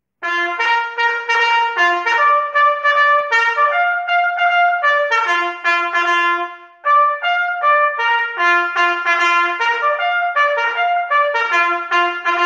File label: Trumpet